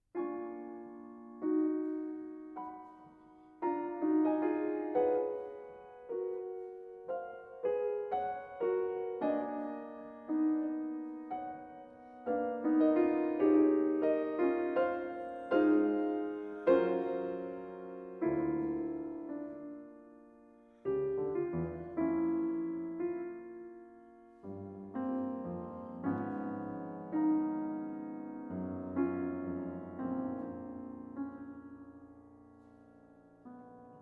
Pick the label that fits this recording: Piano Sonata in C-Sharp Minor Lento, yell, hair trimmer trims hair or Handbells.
Piano Sonata in C-Sharp Minor Lento